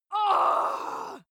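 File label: female death, screams; schreie; menschen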